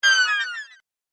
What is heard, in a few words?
Game Robot Bird; video game